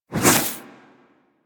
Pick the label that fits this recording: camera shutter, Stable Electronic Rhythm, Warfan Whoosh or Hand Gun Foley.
Warfan Whoosh